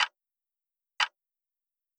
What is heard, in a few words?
TRAFFIC LIGHT GREEN; SIMUZENSOUND